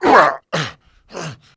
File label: pain; quake2; railz; quakeguy